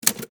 Typewriter Keystroke, Technology, Typewriter, Keystroke, Single; Smith, Corona; Typing; BlastWave